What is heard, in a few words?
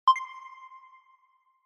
Short Accent Reverb